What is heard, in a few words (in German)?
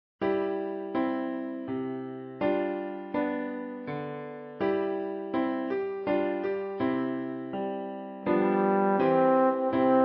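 O Tannenbaum Posaune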